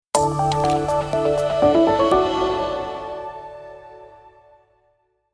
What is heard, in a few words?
Casual Inspiring Logo bpm122